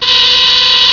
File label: buzz